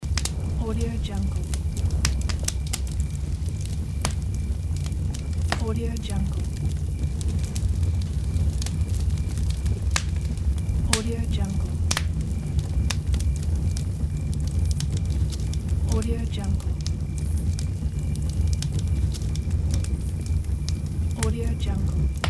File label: campfire